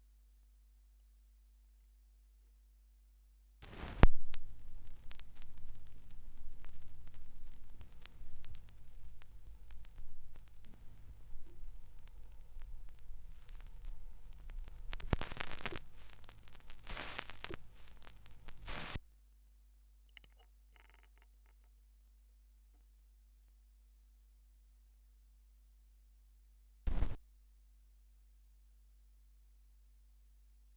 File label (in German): schallplattengeraeusche; rauschpartikel